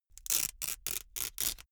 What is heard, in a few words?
velcro large open slow